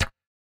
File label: Close icon